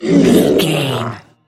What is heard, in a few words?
troll monster death croak